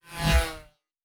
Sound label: Fly By, Sci-Fi Sounds Movement